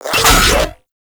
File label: Infested Shotgun Low Clip; Weapons